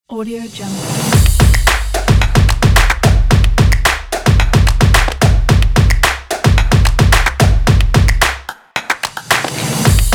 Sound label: the claps in